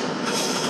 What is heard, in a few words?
Release, R62 Kawasaki, Train; OpenBVE